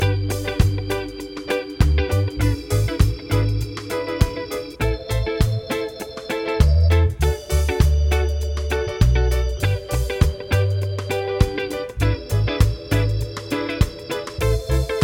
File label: progs with applied chords